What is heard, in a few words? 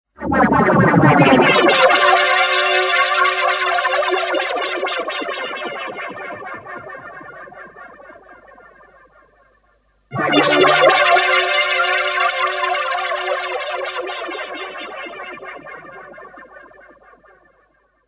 Synth